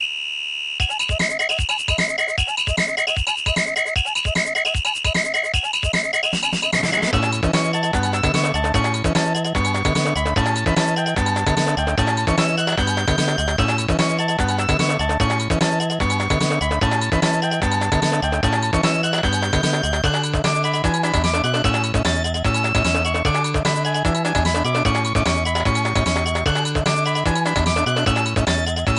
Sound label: Samba